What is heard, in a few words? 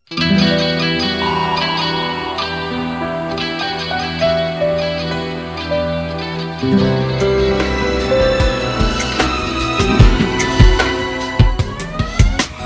arabian song ring